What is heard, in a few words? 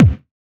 kick, Hardcore Breakbeat; Big Fish Audio; Hardcore Breakbeat Action; Riddim